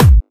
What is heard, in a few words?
Trance Kicks